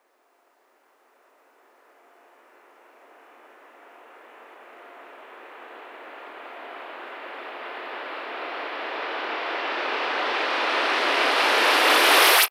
FX Uplifter

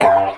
G STAB, funky; Loopmasters; Funky Underground Vol 2

